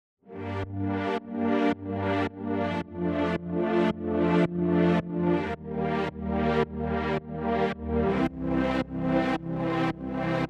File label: Strings Full Ensemble Sustained Step Mod Distortion